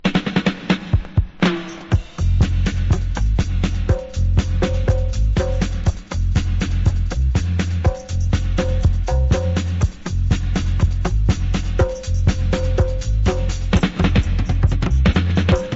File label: Roots Dub